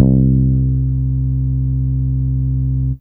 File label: Round Bass C5